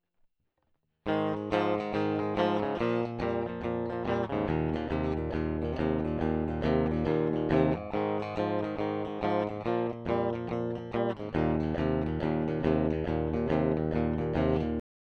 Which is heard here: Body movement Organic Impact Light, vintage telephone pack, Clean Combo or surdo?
Clean Combo